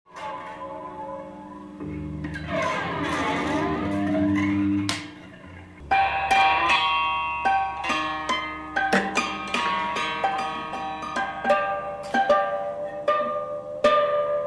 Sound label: koto